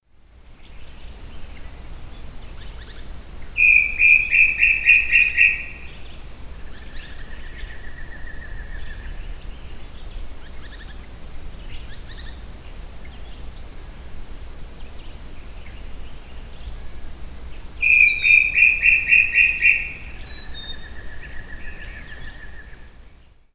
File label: Crypturellus obsoletus; Canela